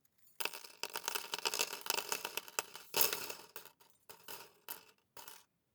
paperclips drop wood table

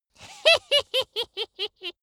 dwarf laugh sneaky

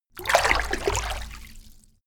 moving

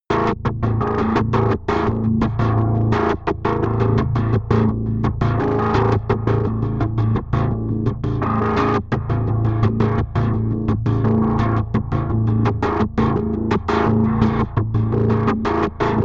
sequence glitchy bass sequence

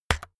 keyboard external key hit fast

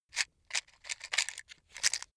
matchbox rattle

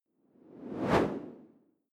whoosh simple

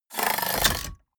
mechanics small rattle

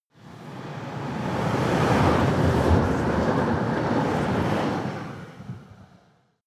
train electric drive by

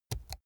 keyboard laptop key press slow soft

